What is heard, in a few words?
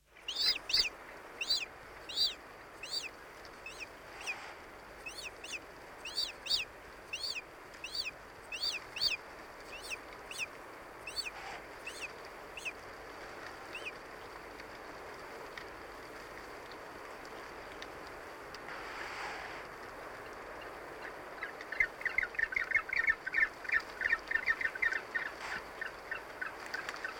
yellow eyed penguin; conservation, native animals, birds